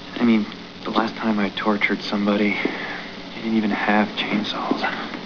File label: chainsaw; I mean, the last time I tortured somebody, they didn't even have chainsaws.